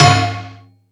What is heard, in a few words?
FX Sounds, Drum Machines